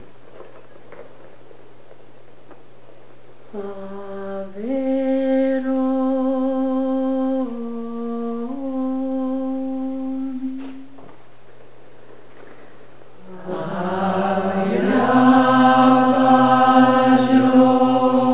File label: metta chant; upaya